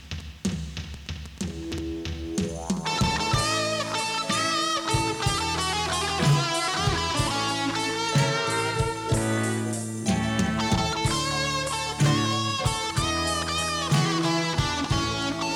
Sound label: Blues